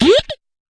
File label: fx drum, Electro FX